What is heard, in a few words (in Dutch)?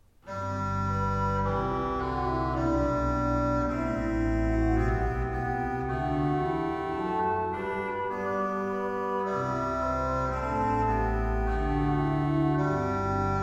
trio